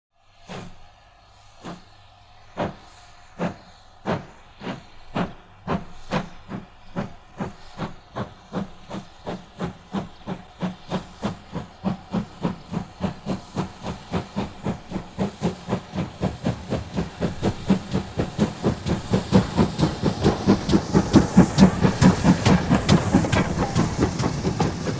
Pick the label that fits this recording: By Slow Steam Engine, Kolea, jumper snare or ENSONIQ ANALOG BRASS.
By Slow Steam Engine